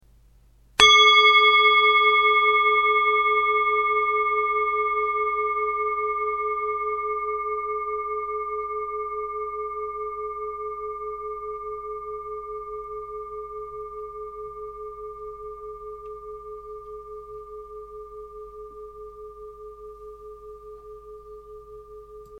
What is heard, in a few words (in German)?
Klangschale; Hamburg